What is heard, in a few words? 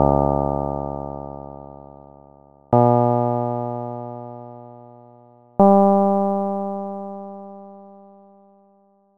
The Sounds of Coffee; molecule sounds